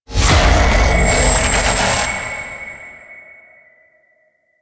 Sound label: Necramech Enemy Activation Vocal; Warframe; Entrati; Necramech Hack